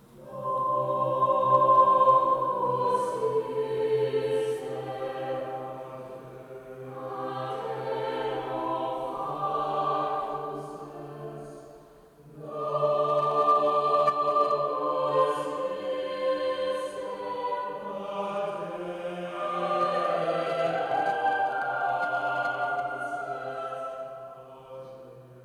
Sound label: Locus Iste Choir